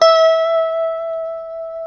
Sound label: E JC120; Guitars; Spectrasonics; Hans Zimmer Guitars VOL-1; JC-STRAT MF